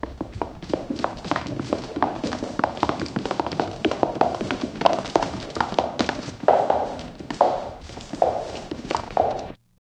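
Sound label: heavy leather boots walking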